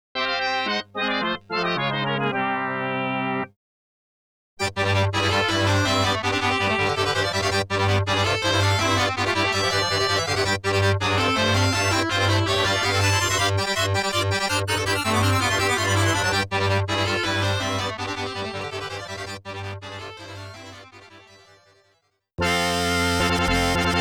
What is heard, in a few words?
REPLAY ~ JACKPOT LIT ~ JACKPOT; JOKERZ!